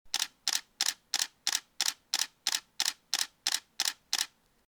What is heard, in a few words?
shutter noise; Canon EOS-70D